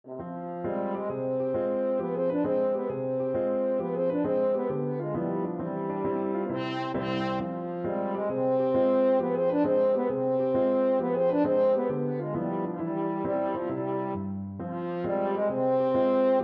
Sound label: sasino kolo, french horn